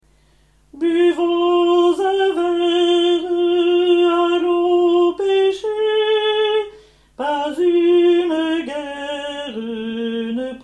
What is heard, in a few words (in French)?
4 hommes; ballade nord-irlandaise